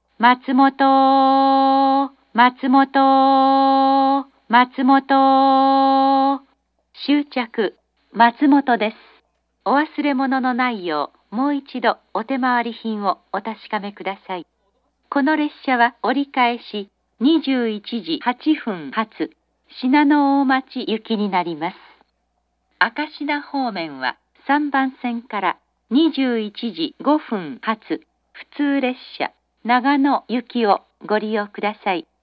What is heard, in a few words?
matsumoto turn local shinano-omachi, station; jr-east